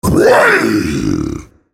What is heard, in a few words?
spell cast effort, en US; Rengar